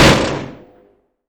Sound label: Automatic Phalanx End, Weapons; DCS